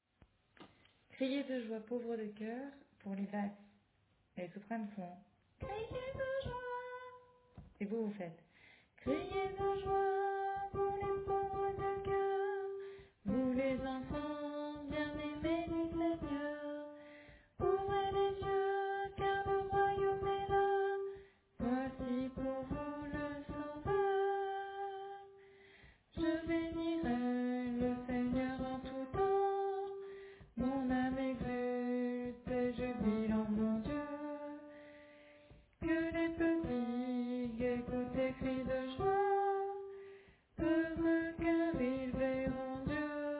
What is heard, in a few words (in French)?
Basse